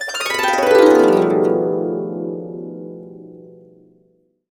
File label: B, Harps